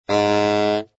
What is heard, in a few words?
Bb3 very loud, saxophone tenor; Bb3 dynamics